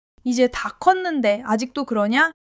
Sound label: laughter; monologue